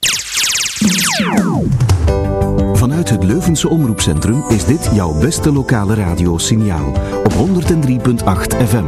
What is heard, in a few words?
jingle; Sinjaal Leuven